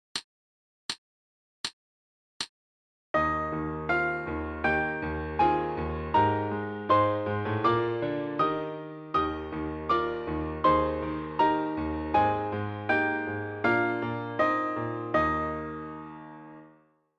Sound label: E-flat Concert Quarter Notes